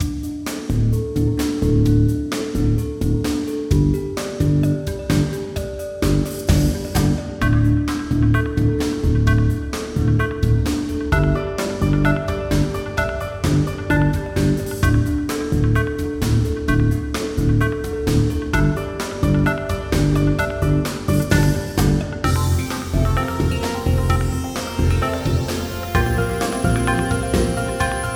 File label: GTR